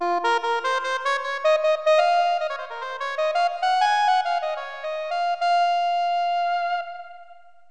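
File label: soprano sax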